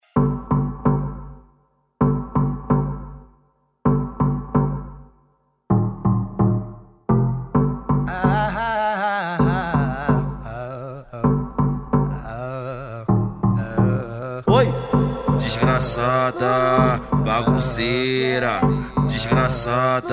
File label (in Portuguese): funk evolution audio car